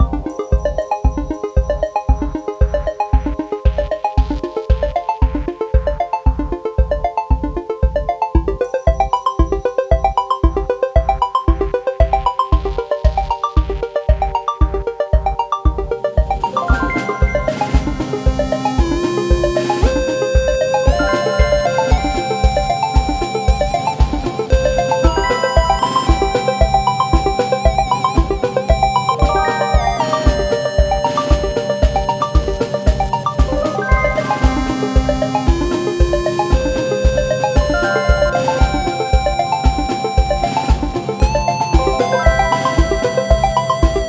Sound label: Level Up; SanctuaryRPG